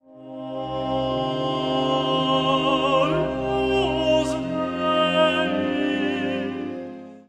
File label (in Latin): Agnus Dei Tenor